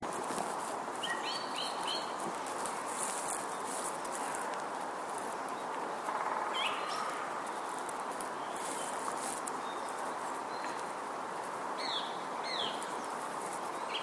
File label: woodpecker